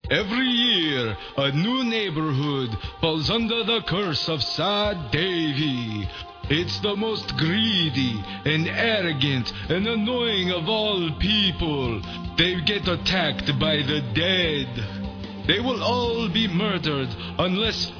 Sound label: Crush Groove Heavy Metal